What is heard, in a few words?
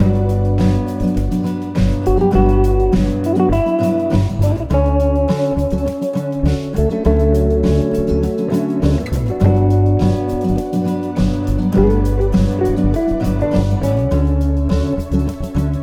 Jazz